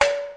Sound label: Electro FX; Ueb HE Electro FX